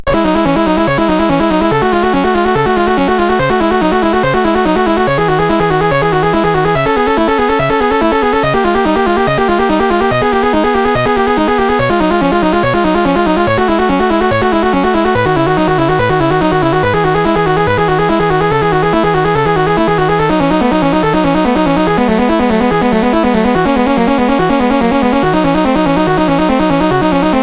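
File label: River Chase, MOS 8580 SID chip music, games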